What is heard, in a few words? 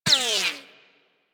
Laser Whizzby; Warframe; Weapons